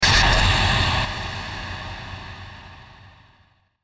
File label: Clamor Hit Metal; Ninja Tracks